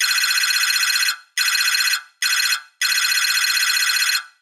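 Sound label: electronic sounds of data transmission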